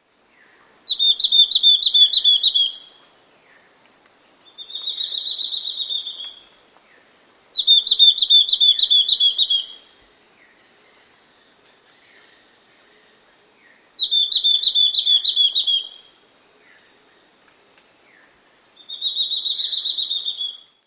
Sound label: Ferruginous-Backed Antbird; S. America